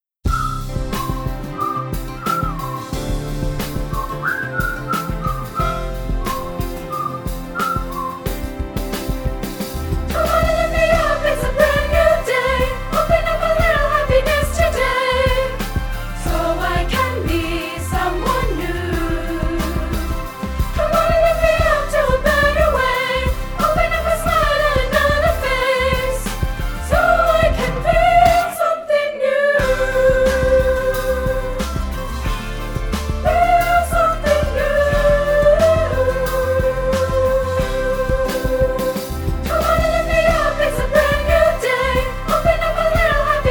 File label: SOPRANO; COKE-OPEN